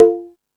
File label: Latin Layers, drum machine